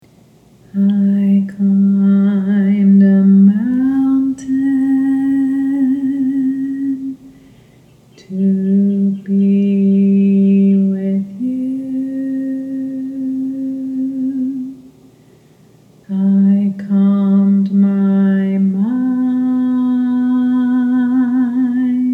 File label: Low Harmony Only